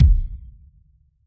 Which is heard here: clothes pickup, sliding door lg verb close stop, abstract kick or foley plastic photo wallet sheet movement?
abstract kick